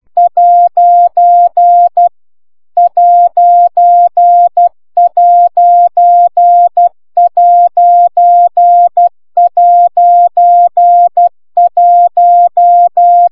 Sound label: cw